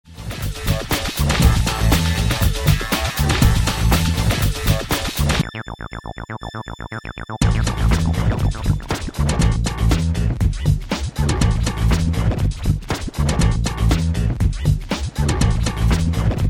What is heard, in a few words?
rhythm and thangs